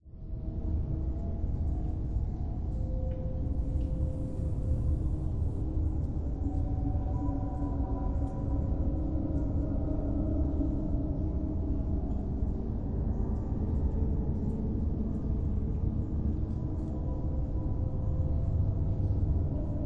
hide and seek ambience; SFX; Ambient

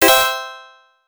Coin

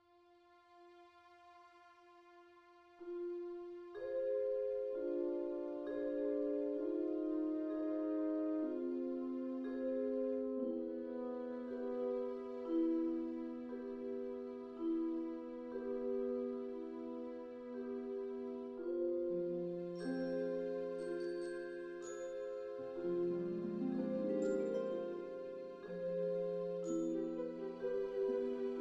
The Cave Theme (Orchestral); Cube Escape The Cave

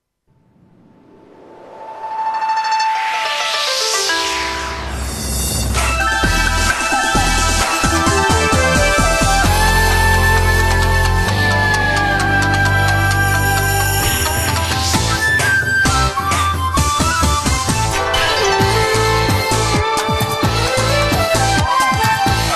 BGM Jap Rock Title; Magic Sudoku Numpla Oekaki Puzzle; psp

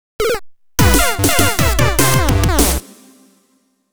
Result Eliminated Game Over

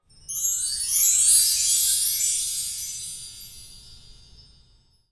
Unused Jingle Chimes; Pokemon Sword; Pokemon Shield; The Crown Tundra

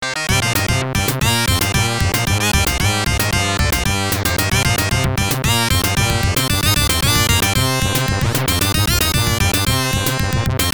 Round Clear; fighting hawk arcade

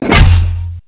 MORTAR

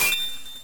SFX Get Shooting Star; life is a game android

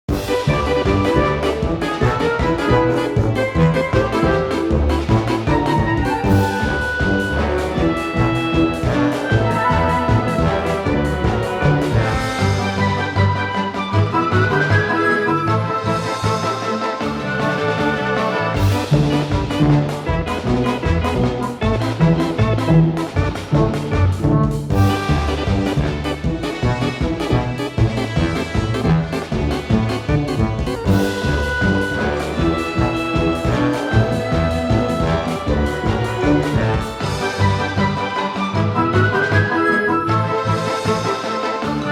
Hoedown; don t starve together; Goose

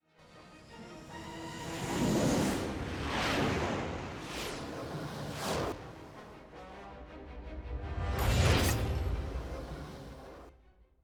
Boss Intro SFX; lego the incredibles 2018 switch; Cutscenes - Hubindustrial

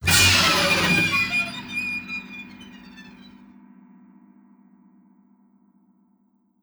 Shock; Black Mirror 2 Reigning Evil

